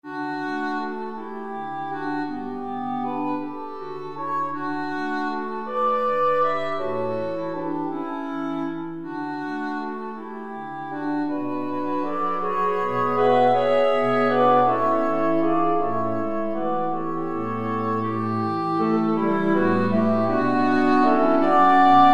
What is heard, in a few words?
mistletoe